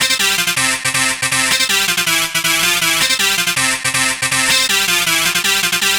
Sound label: Dance Synths, Hardcore Loops, Synth Loops